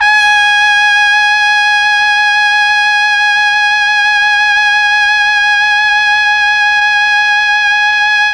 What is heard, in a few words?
G#3 TRUMPET, Mellotron; Mike Pinder - Mellotron Archive CD